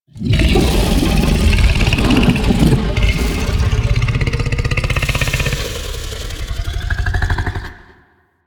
Pit Monster Exhale, Infested; Sleep; Warframe

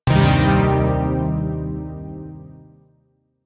Shawzin Blues Chord A; Zariman Shawzin

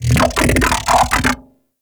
Mech Ambient Vocal; Nechro Mech; Vehicles

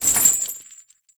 Rock Trap Chain; Objects; Ode Lantern Crate

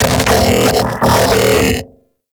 Mech Ambient Vocal; Warframe; Vehicles; NechroMech

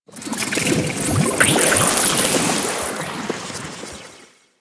Excavation Scanner Random; Missions

